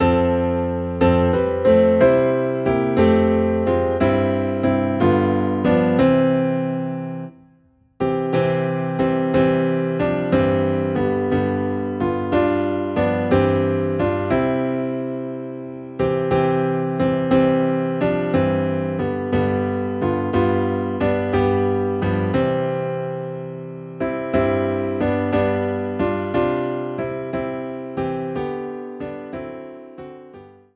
Piano Low in C, hymns; Faith is the Victory

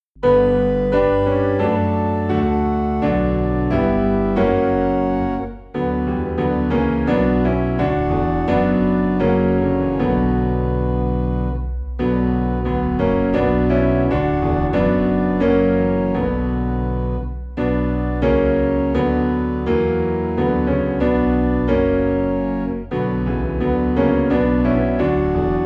Piano and Organ Low in B Minor; Jesus Lover of my Soul

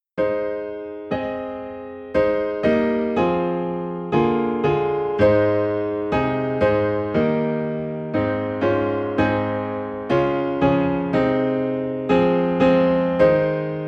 Piano A Flat; How Firm a Foundation; hymns

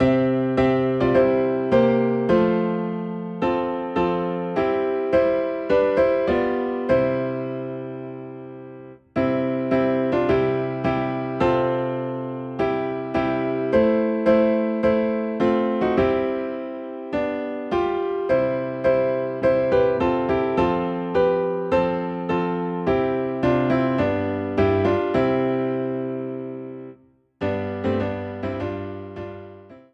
Piano in C; Tell me the Old Old Story

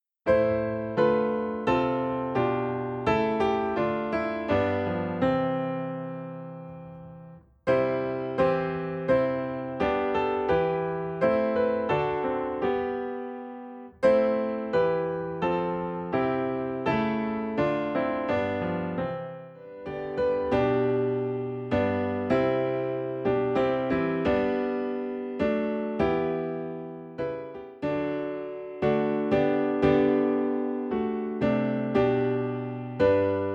piano

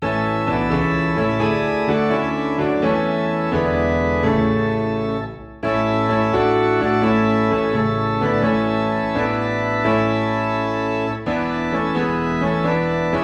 Piano and Organ Low in A; Anywhere With Jesus

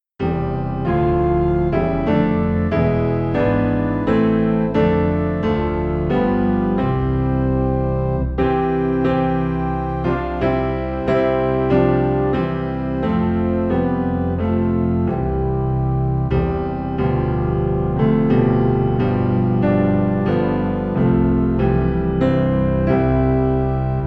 Piano and Organ Low in C; Away in a Manger; christmas

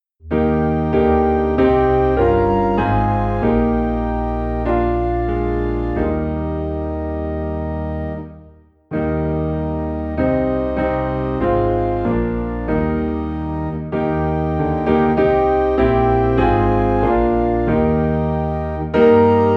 Piano and Organ in E Flat; Fairest Lord Jesus

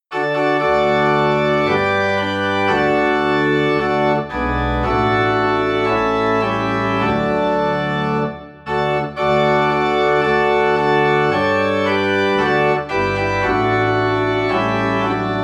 Organ in D; What a Wonderful Savior

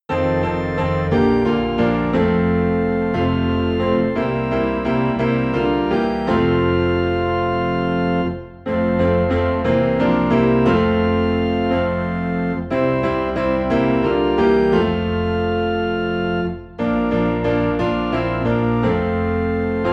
piano and organ low in F; There Shall Be Showers of Blessing

